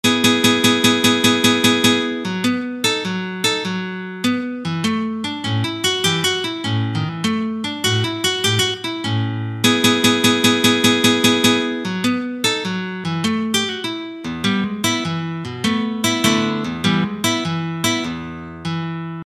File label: Classic acoustic blues in E